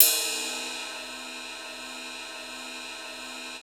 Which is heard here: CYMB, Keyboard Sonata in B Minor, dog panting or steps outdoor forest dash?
CYMB